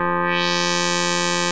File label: harps, synths sh101